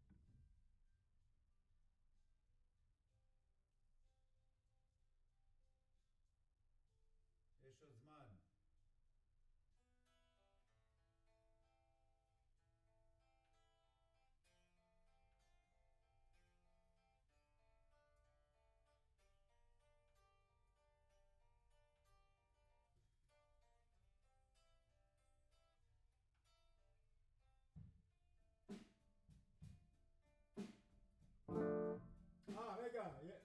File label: Piano B